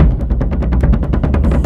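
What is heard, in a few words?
Music Shield; From Dust; PS3; Xbox 360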